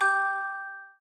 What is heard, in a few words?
count Up Loop End; excalibur